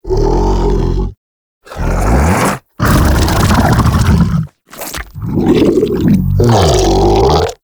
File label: Interact Raspberry; Liset Alchemy Lab; Helminth; Kubrow